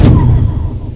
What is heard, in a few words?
door stop; tfc